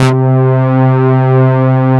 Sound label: SYN, synthesizer bass; VFX-SD